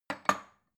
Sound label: bowl shelve